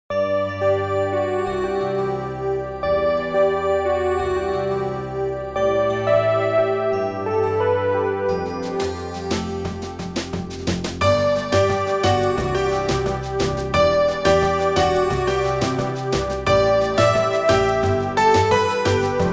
Beautiful alarm; Sony Ericsson W890i

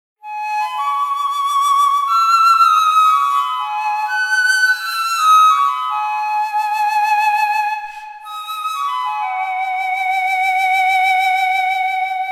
Orchestral ringtone

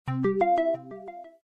Bubble, phonetones; LG VN530 Octane

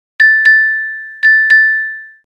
Gamelan sms; 8800; Alert tones

